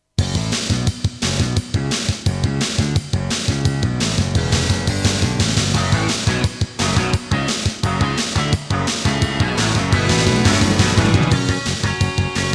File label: Intro, Dreamblaster S1; Serdaco; Duke Nukem 3D